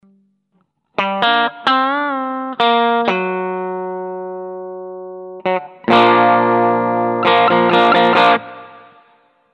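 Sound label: bridge, guitar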